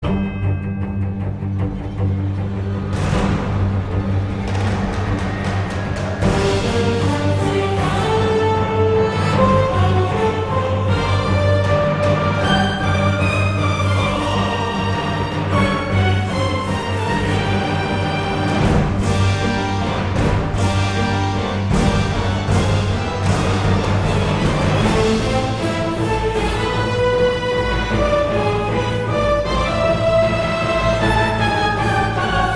Music Vamp Normal Battle; Bloodmasque

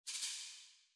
Fruit Shake; Forest SFX

